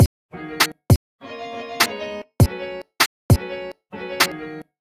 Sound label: R&B Beat, 100bpm; 8-beat R&B instrumentals from sounds extracted from chart song